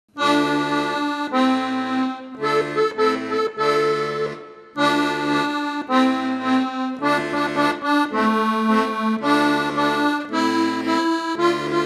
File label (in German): inst; hejo spann den wagen an